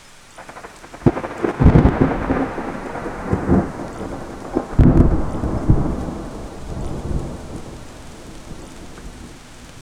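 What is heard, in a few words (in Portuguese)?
trovão massivo e caótico, storm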